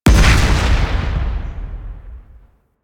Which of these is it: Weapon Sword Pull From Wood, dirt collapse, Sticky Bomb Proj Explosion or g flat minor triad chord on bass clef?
Sticky Bomb Proj Explosion